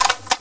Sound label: uzi, akimbo deploy